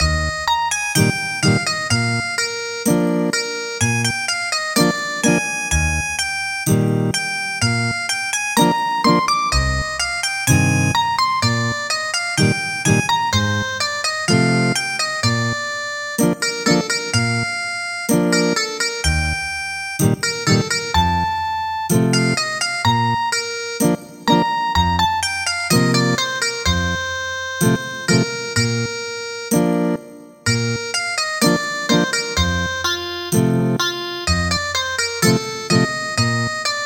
Ethnic Guitar

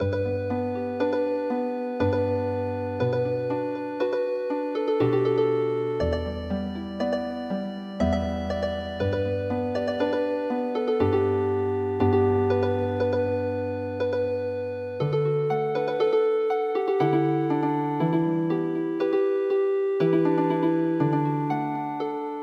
Healing Harp; Dao la ji Chaina

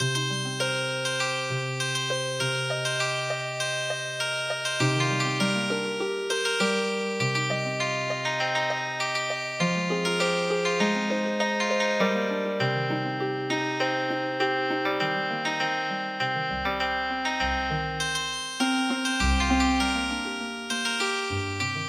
Healing Harp

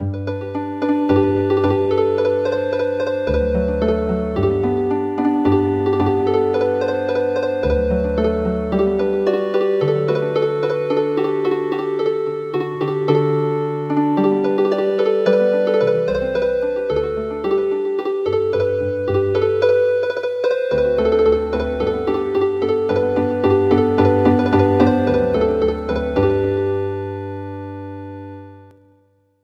Harp; The dance of barley

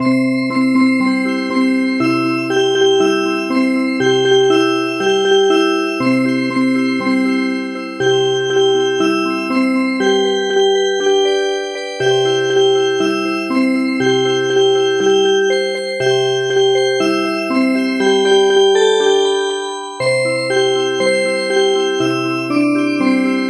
Music Box; Gom se mali

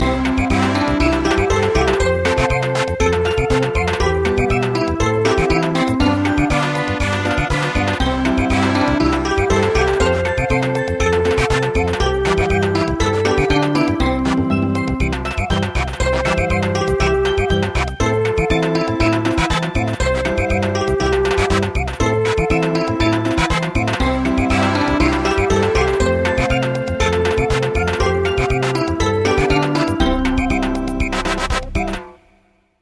Samba

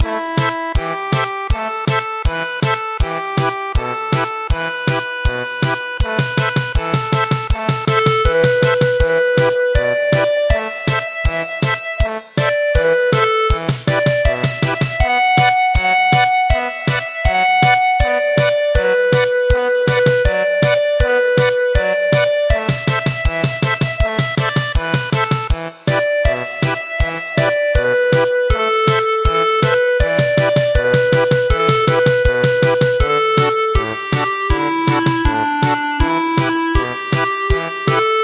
German Polka; Choshi Tairyou Bushi

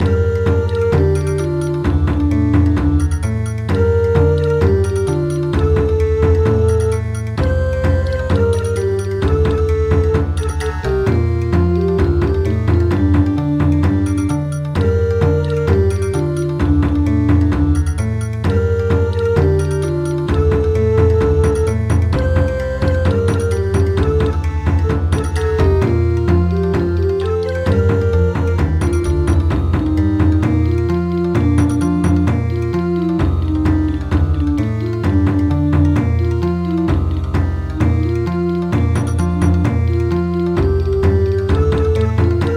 chirp chirp chirp Bon Odori

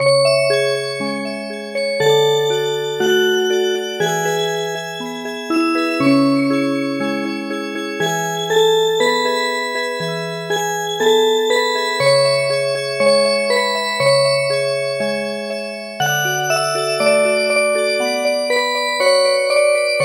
Music Box

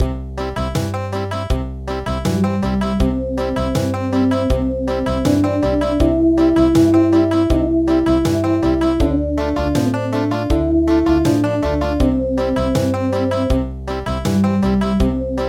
Country

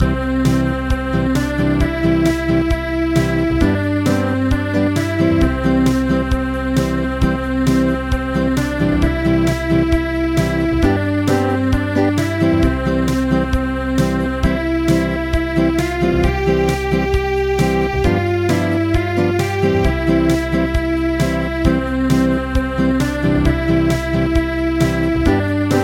Acoustic

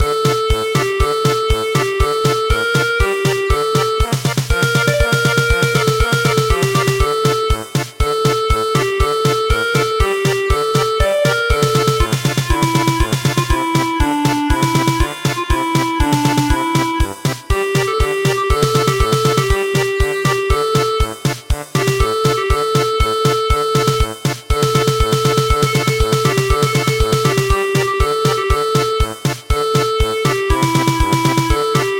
German Polka

